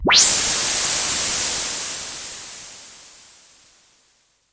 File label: Super Bass Station, Noise Sweeps